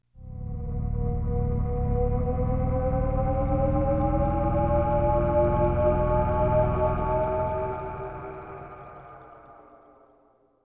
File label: AMB; ambiance